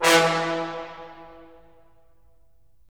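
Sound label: brass; Roland - LA Composer vol. 3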